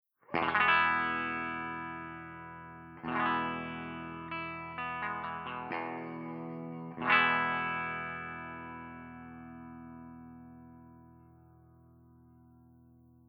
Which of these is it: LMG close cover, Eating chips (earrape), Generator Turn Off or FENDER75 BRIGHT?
FENDER75 BRIGHT